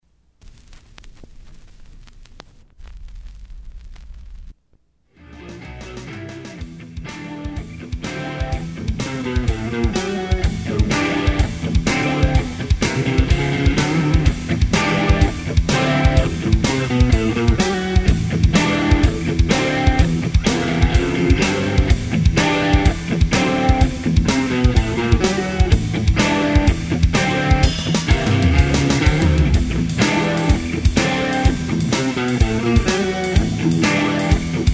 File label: Riff